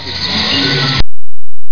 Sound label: rattle; CNN